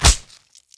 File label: knife stab